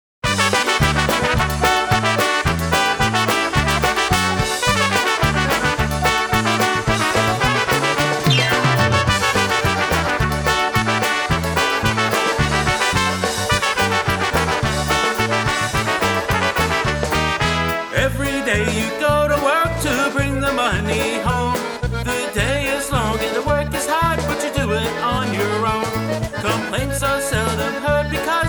Polka

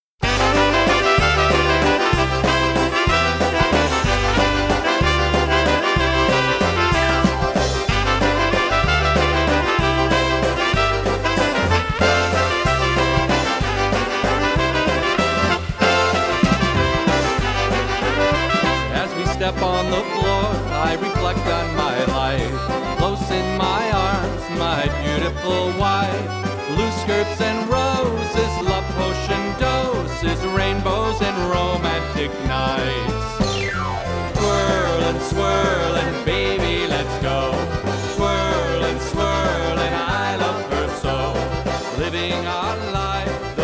Twirl Swirl Oberek

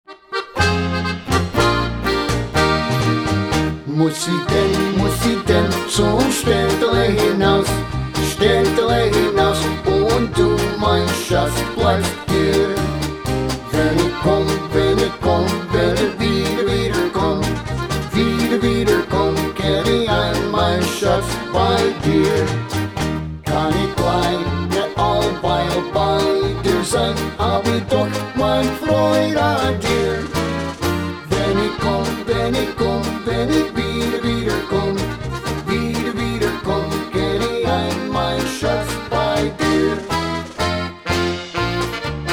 Medley

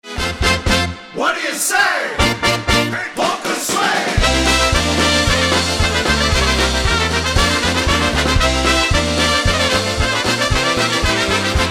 Polka Swing Polka

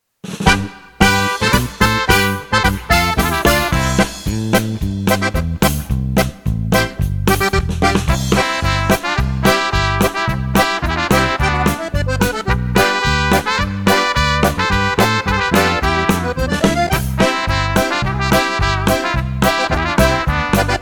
Polka

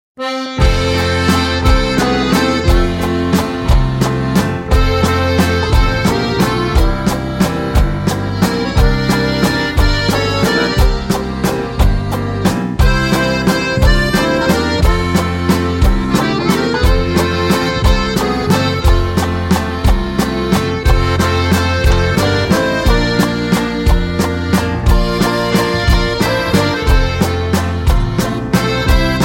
Waltz

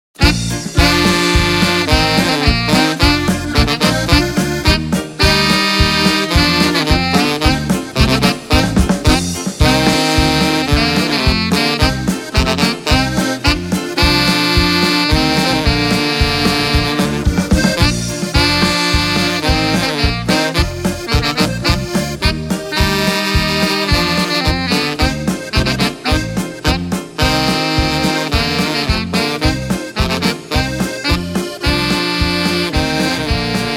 Music Music Polka

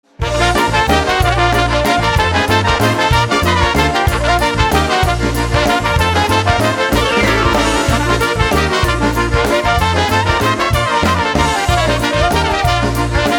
Oberek

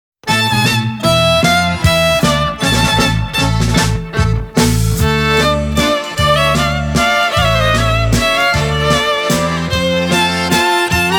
Polish Blood Kujawiak

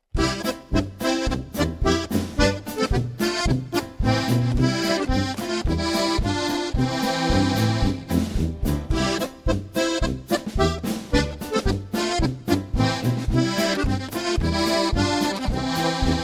Polka

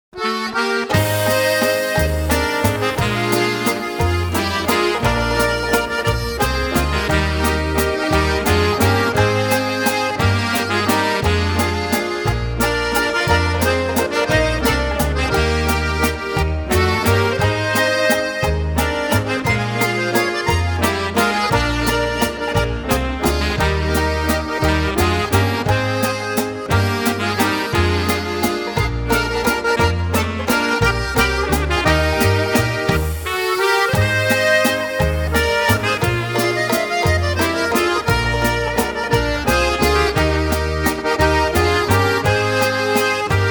Whispering Waltz

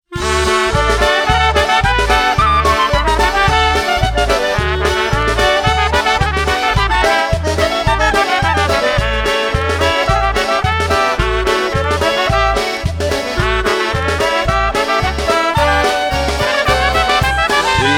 Polka

